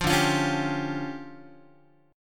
D Minor Major 7th Double Flat 5th, D, Guitar; DmM7bb5; strummed; JGuitar

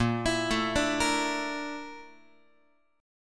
Bb Major 7th Flat 5th, Guitar; arpeggiated

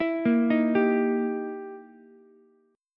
E Minor Major 7th Flat 5th, E, down, Ukulele; arpeggiated; JGuitar

